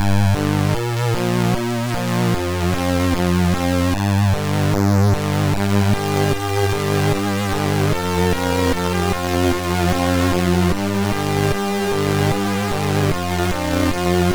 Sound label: Shoot Out, MOS6581R2